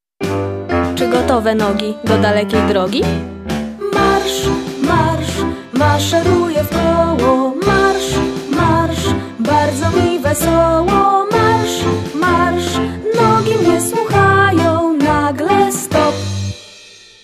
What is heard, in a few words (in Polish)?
Marsz